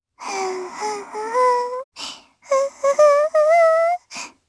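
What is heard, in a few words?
Vox Hum, Japanese; Lavril; kingsraid; jp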